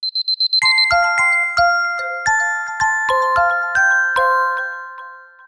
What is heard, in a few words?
Alarm Kalimbell; Vivo; E1T; alarms